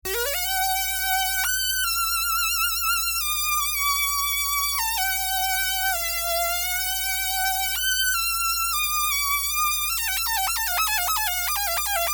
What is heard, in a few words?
microkorg